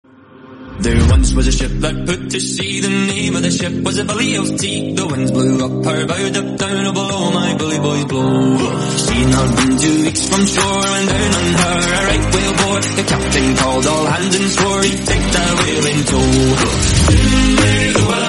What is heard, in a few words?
bgm remix